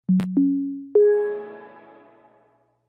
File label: startup; windows 11